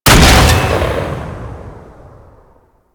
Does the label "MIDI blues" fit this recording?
No